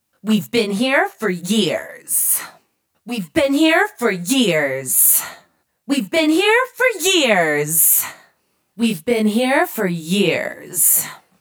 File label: weve been here for years processed vocals